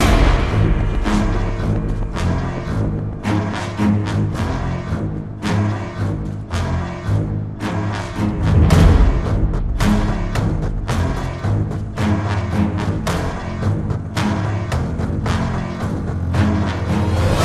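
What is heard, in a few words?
intro loop; transformers war for cybertron gamerip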